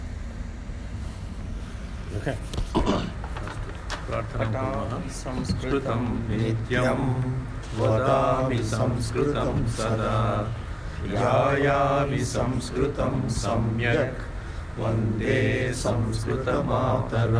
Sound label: Temple Sanskrit Prayer